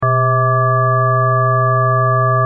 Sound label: Sine Ring Modulation; gravity-force-lab-basics